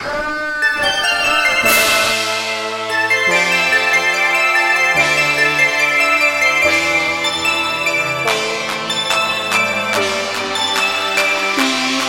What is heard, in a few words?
New Beat